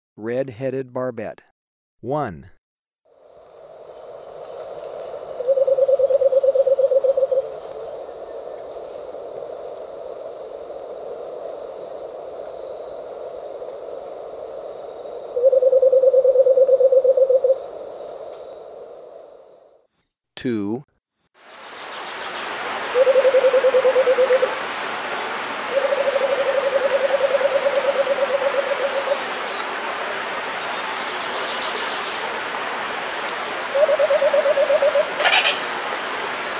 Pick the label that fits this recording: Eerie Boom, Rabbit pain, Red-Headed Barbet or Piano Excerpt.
Red-Headed Barbet